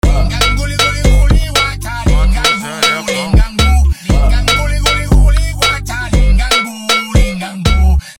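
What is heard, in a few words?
brazilian phonk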